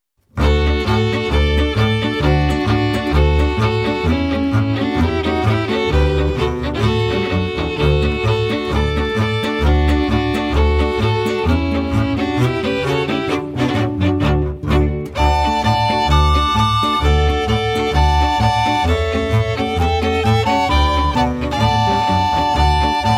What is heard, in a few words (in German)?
Polka